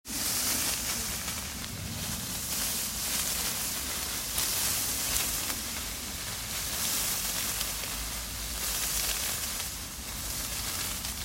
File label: Tree leaves